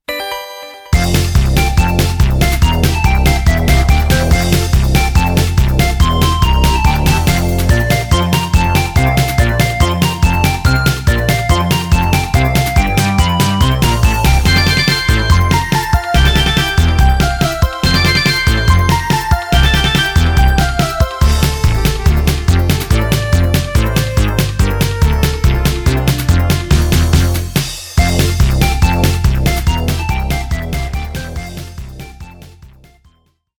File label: percussion head